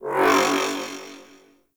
Magnetize Activate; Warframe

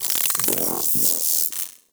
Venus Robo Fish Small Caught; Warframe

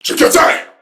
Surprised Crewmen; Warframe; CorpusCrewmen; CrewmanB

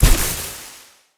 Casting Device Block Impact; Weapons; Player

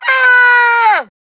Scream; Grineer Queens Guards; Battle Barks; Warframe

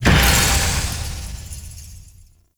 Death Explosion; Warframe; Duviri; Dax

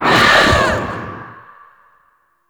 Hysterical Assault; Warframe; Warframes; Berserker

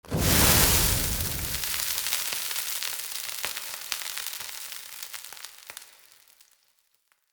Fire Hazard Water Extinguish B; Undercity

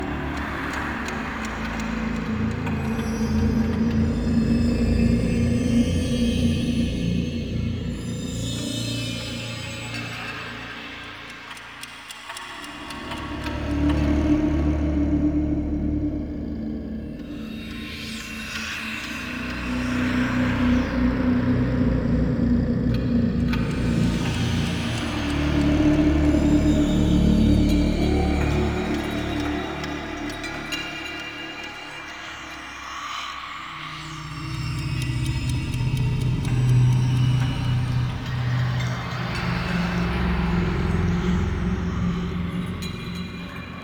Exterior Hub Loop, Ambience Infested; Warframe; Infested Eris

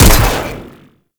Electro Mag Shot C, Corpus weapons; Warframe

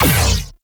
Gatling Laser Shot; Warframe; Railjack; RailJack Weapons

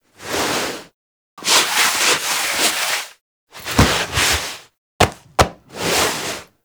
Ram Foley Pet; Animals; Duviri

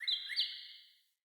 Cardinal Male Bird Double Chirp

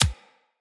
Bullet Impact Hit Notification Two; Weapons

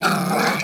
Puppy Idle Growl Sustained; Vocals; Warframe; Kubrow

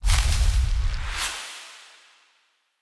Blind Cast; Fey; Saboteur

